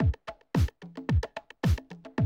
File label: drum loop, 100 BPM